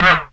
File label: Gong - Slip Fall; Neighbours from Hell Console Edition; GC, Xbox